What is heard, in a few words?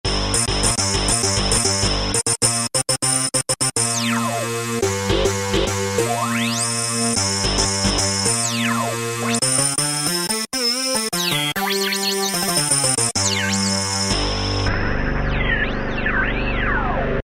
synth-Raunch; E-mu Orbit V2; Factory presets